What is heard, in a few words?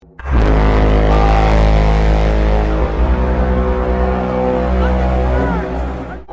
broken tripod horn